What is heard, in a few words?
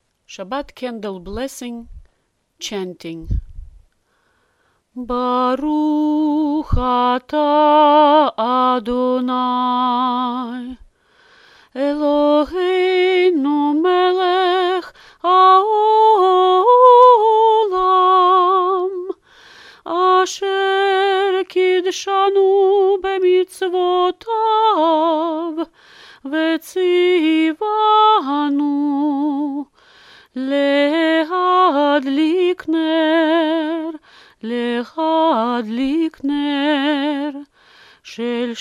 Candles blessing chanting